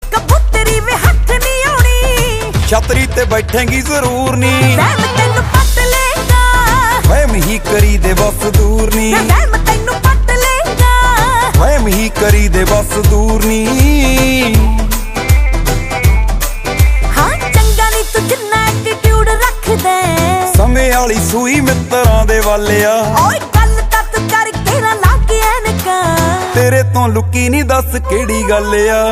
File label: Ringtone